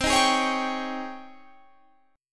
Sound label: G sharp Major 11th C